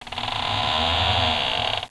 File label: Creak; Princess and the Pea; SoftKey